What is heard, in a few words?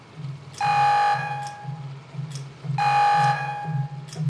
warning; cstrike; ambience